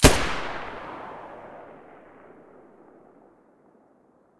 muzzle mg36 mono

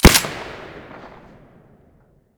saiga silencer stereo, weapons

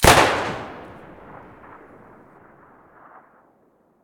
saiga muzzle mono, weapons

